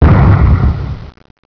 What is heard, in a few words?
Explosion; Bombzuka; Retro